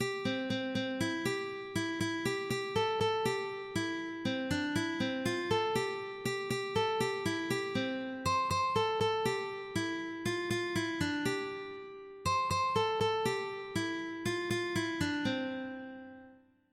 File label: škedija, ukulele